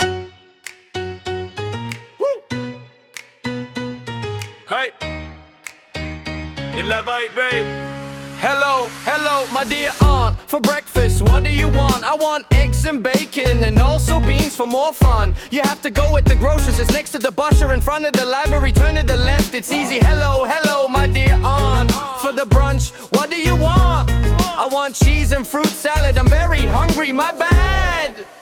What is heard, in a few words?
rap version